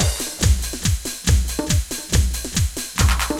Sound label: techno 140